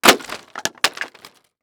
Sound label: Impact Wood Crash Mic On Floor; Impacts